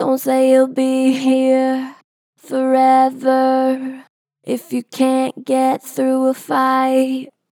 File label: Str Flat Dont say you be here, Vocals, Pitch Flat Strong